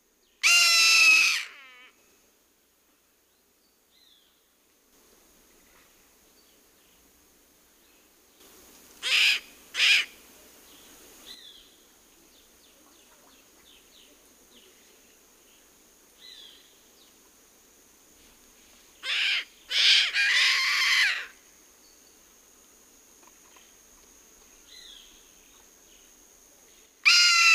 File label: Daptrius ater, calls; S. America; Eastern Lowlands